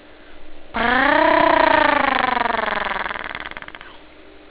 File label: purr